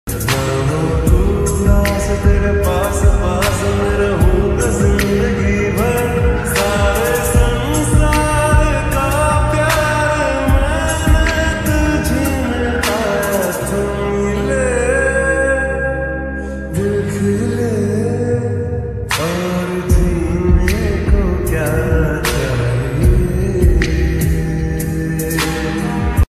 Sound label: slowed reverb lofi remix ringtone love ringtone